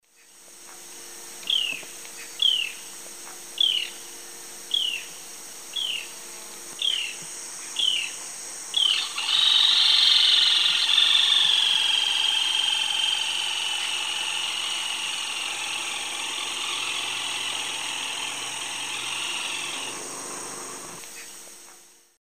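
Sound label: Laterallus melanophaius, calls and song playback; S. America; Eastern Lowlands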